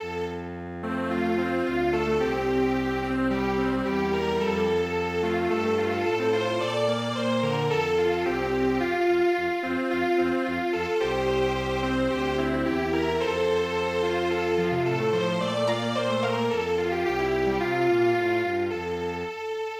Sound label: quartet; kotoritachiha